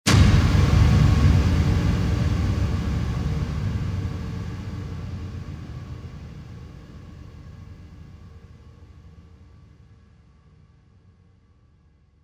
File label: Silo Hit; RaySpace